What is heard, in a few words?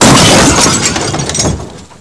crate, impact